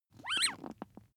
bath duck squeeze soft

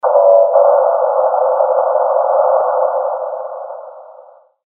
layer noisy midfrequent

